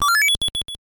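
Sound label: sounds for notifications on phone in 8-bit style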